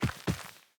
Foley; Cinematics; Drifter Lotus End

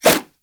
nunchuck whoosh; Warframe; Infested; weapons

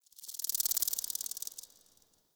insect night; Ambience; Warframe; Eidolon Plains

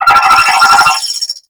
Space Drone Attack; Warframe; Corpus Space Drone

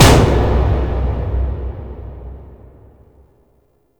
Hand Slap; Warframe; Tau; Cinematics; LastBreath

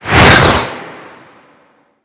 Air Rush Slow One Off; Vehicles; Archwing; Surface Air Rush

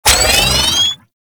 Sniper Reload Clip In, Weapons; Warframe; Sentient; Caliban Prime Sniper

